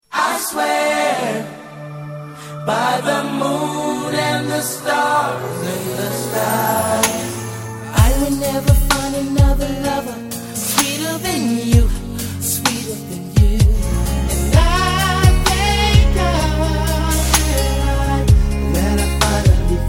Romantic Song

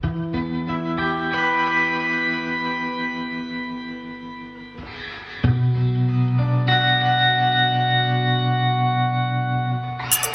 Abstract indie

